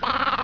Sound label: baah